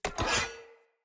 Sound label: Cleaver Equip, Swords; Weapons; Player; Bromius Cleaver